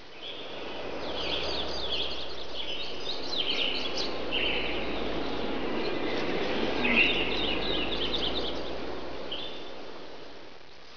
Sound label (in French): oiseaux; cs villa2003